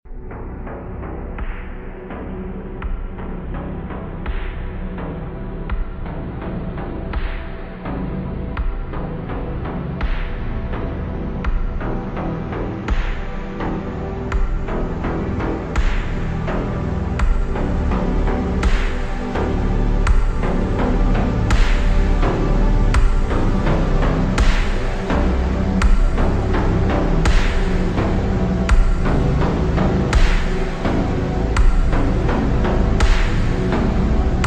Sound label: perfectly slowed reverb